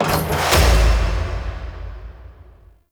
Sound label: Defense Reach Squad Stinger; Encounters; Grineer